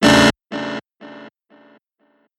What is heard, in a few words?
notify